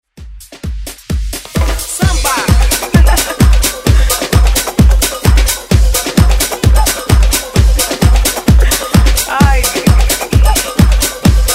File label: Afrobeat; Redrum